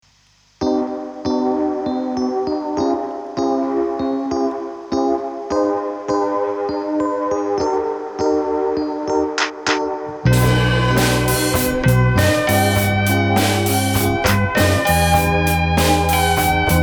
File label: Wobble